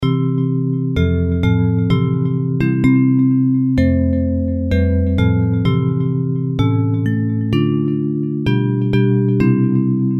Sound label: Bells, hymn